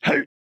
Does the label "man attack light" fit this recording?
Yes